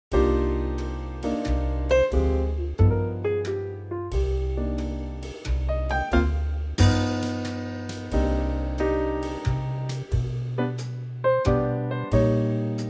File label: Cm trio; key - Cm - vocal range - B to Db; Classy Trio arrangement of this gem from the movie "Chitty Chitty Bang Bang".